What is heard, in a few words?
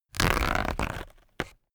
paper towel roll sheet tear off